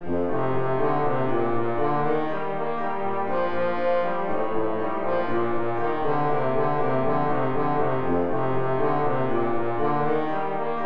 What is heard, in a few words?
trombone